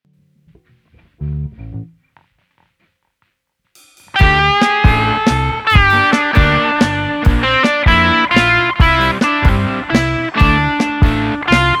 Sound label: drum practice; weeklybeats 2024